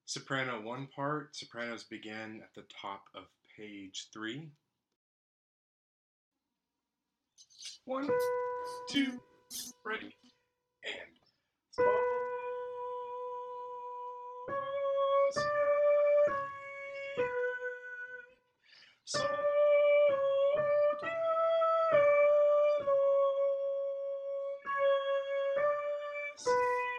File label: soprano 1 salvation